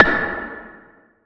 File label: E-Perc Tiny Cavern Anvil, Electronic Percussion, Drums; Ableton Live 12 Lite; Core Library